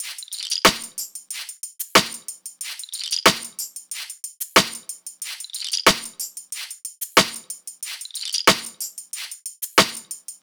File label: top loop 92; Pop; Drums; Loops; Slate; SD PASADENA Pop Sample Pack